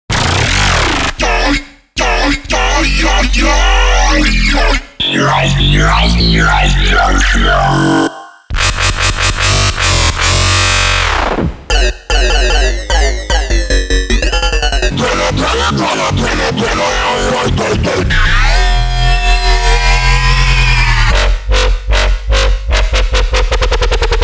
phase plant bass expansion

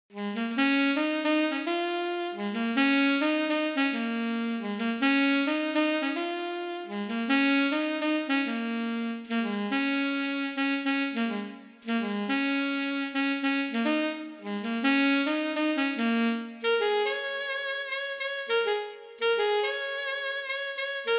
brass alto sax

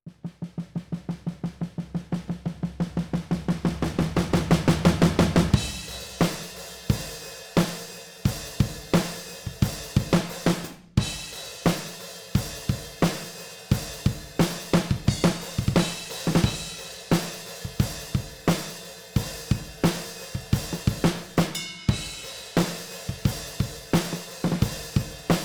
alt rock drums

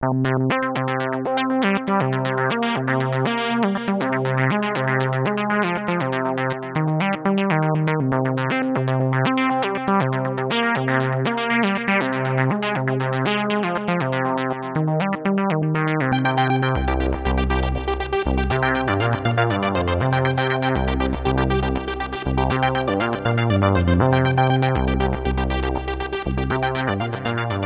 random filter rave arp

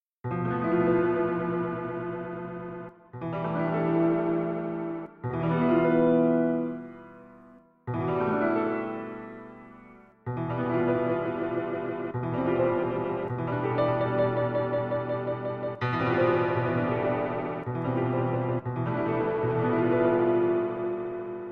glitch piano

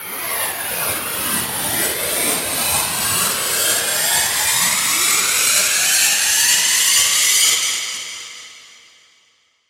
club risers

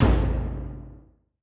bump stomp; nova sound

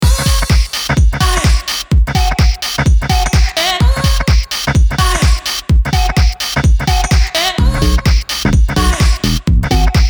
90s garage

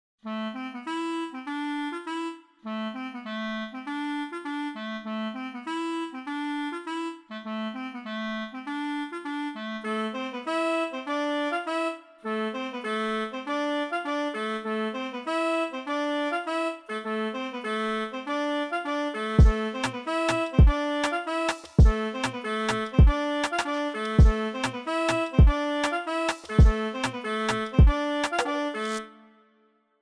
clarinet; treasure

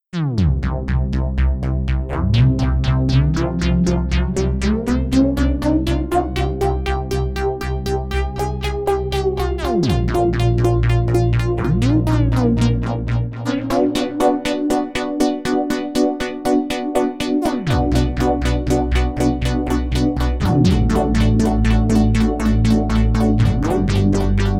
deep water sequence

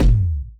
distorted band kick

